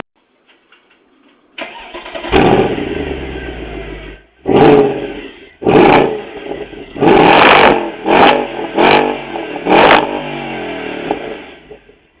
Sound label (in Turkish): motor sesi